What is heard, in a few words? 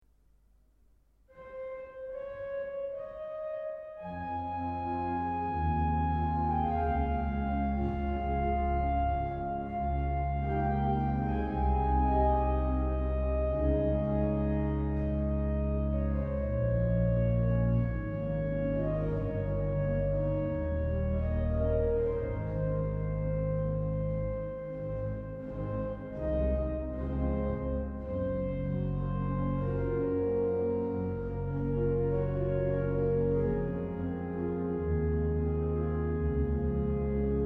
Cavatina e vivace